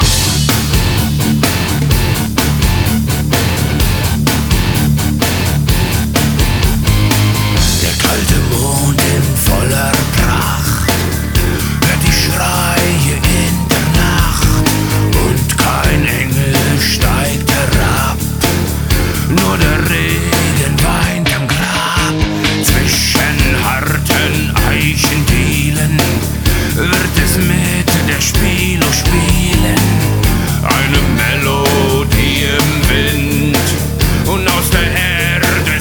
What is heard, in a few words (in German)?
Spieluhr